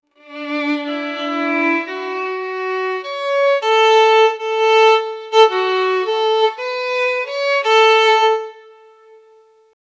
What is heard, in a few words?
a beutiful tune of violin